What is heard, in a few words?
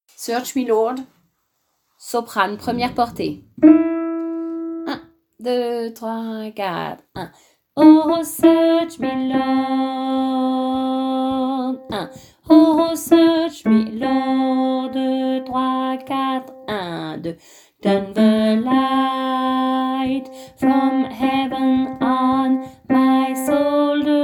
Search me Lord Soprane